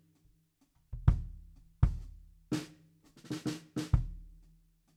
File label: BD Out